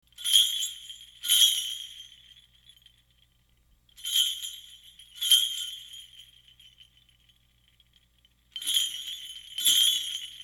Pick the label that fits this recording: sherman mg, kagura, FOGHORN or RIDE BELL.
kagura